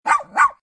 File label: dog